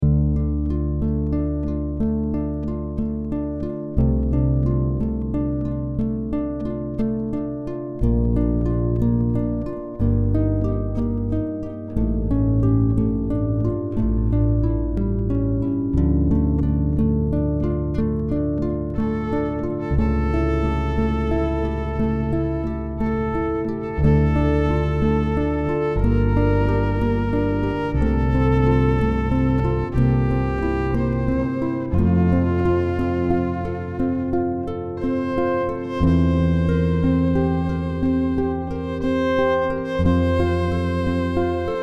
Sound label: Duet, Electro-Acoustic Harp + Electric Violin; Moonlight Sonata